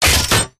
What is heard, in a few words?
gunstand shotgun reload; gow; turret judgement